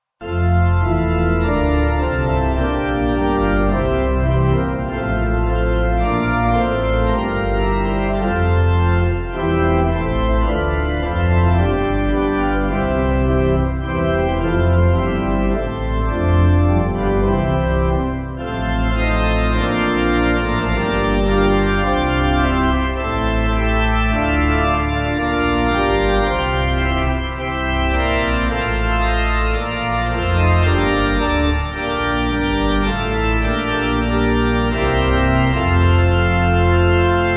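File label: Pipe Organ, Music Backing; Round The Lord; Rustington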